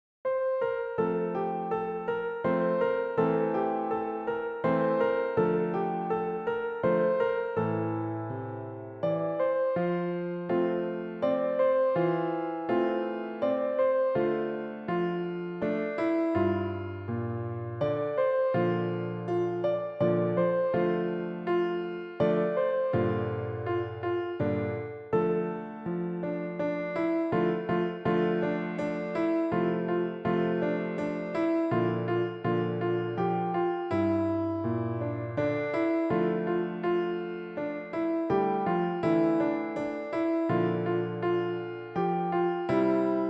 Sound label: Piano Only; QUANTIZED